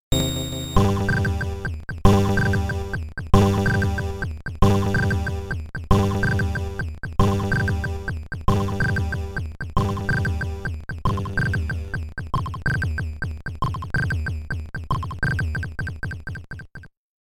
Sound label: break time (auto pause); Mario Clash; virtual boy